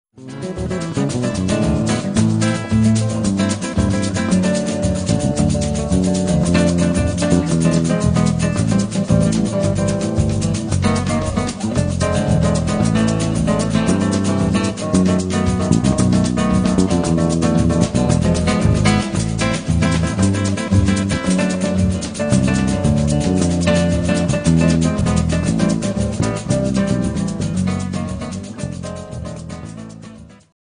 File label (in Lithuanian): Samba (Brazilija)